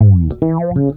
Bass FX; Basses

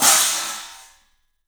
CYFX, EFFECTCYMB; 3D Sound; 3D Drums

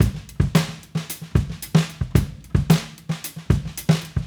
Raw Funk Drums, 70s Analog Funk

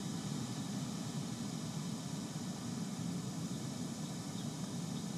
Ambience Loop, outdoor

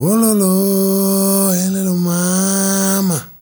MALE SOLO, Heart of Africa

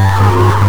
FX REVERSE

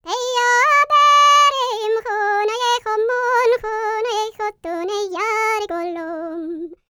PERSIA, World Voices, Vocal

